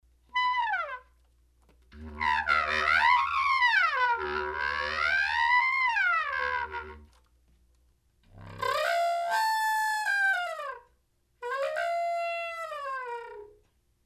Harmonic elephant call

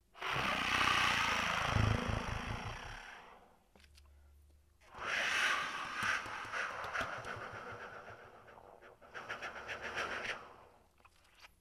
Windy Tenor

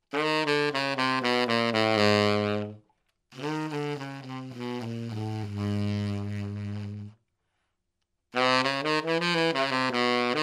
subtone

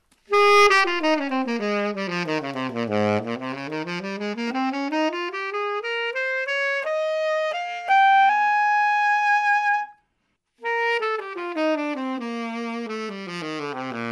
Classical Jazz Tenor

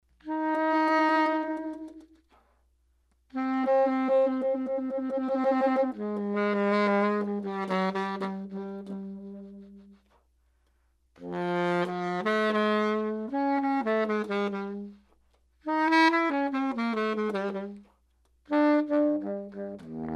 bisbigliando baritone sax

